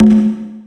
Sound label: Big Conga; Zero-G Sound Sense Grime Scene; GS Kits